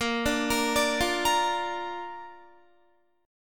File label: A sharp Major, Guitar; arpeggiated; tuning 4E,4A,5D,5G,5C,6F